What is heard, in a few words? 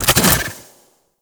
Melee Whoosh; Enemies; Abilities; Warframe; Corpus